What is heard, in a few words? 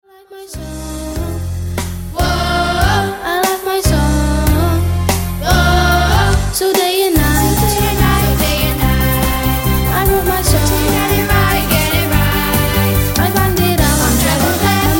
Funky Groove; Ludwigs